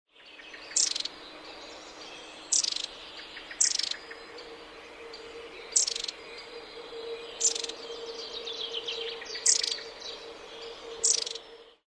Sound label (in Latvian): call, balsis; rudbazi; i.folkmanis